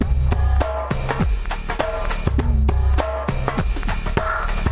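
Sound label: compressor swinger bass, drum loops and breaks, 101 bpm; 101 to 110 bpm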